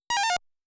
Credit Sound

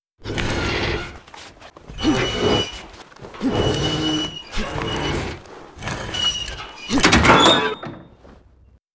Open Door

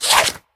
Page Turn; Angry Birds

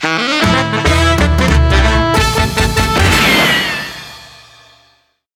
Gameover; Katekyoo Hitman Reborn Dream Hyper Battle; wii

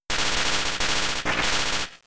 Spark

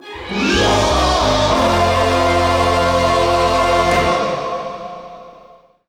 Place Goal Fanfare; La Brea Car Pits - 2nd Place Goal Fanfare; crash bandicoot series sound box

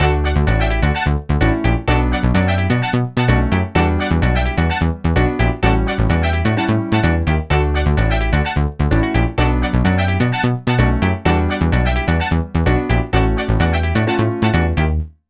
Disco

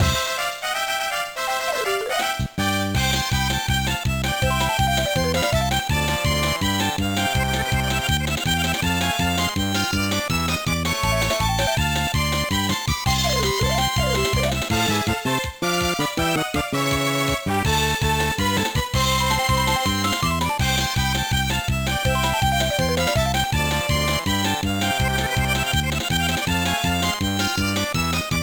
BGM; kurohige no kurutto jintori 2002 gba

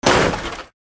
Impact

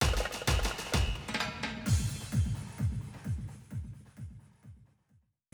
BGM Plaza News Fes Trans

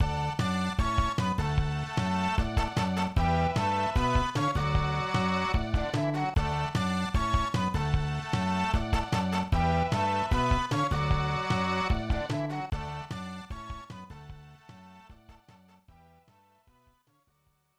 Victory!

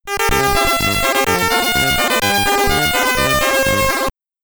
End of Level, SID Stereo; Forester; commodore 64

